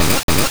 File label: Bite